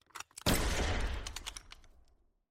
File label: bad sniper